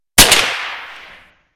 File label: Inf Weapons; Red Orchestra 41-45